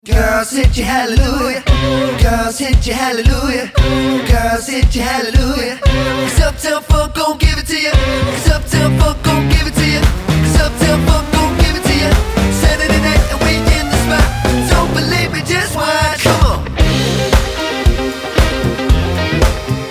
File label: Rock Pop Band; WestSussex